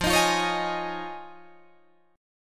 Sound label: F Minor 7th Sharp 5th Guitar Drop D, chord